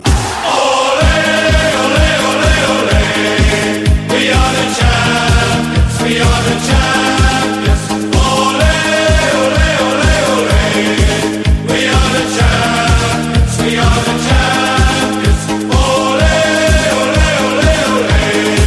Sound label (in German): ole ole ole ole ole Fussball